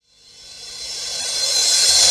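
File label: crash reverse, Dance Mega Drums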